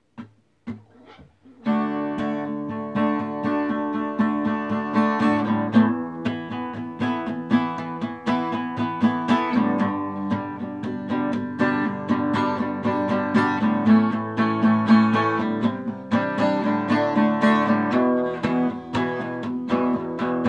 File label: acoustic; brick and mortar; torchys tacos